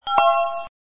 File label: door bell